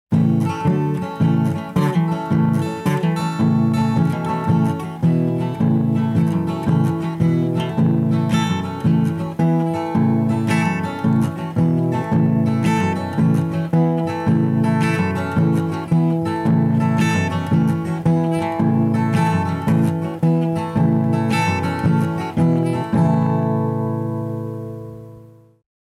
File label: Instrumental